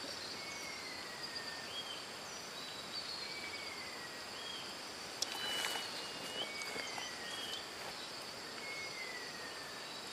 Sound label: Cinnamon-attila, پرنده آتیلای دارچینی